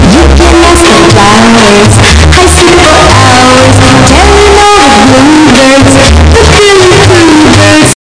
bass boosted

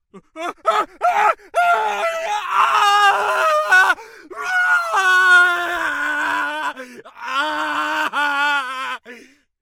french guy screaming

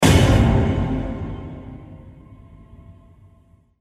bang bang bang boom

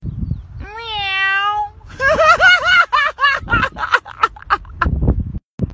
indian guy meow

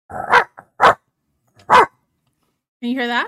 barks

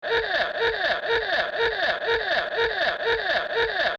car horn

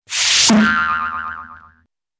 scrape and boi yoi yoi yoi yoi yoi yoing

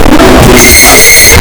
harmonica loud

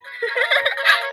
cute giggle

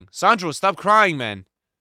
cry

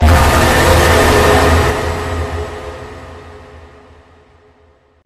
jumpscare sound

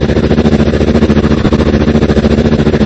generic podracer engine; lego star wars

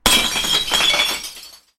glass breaking

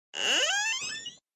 floor door open; rooms

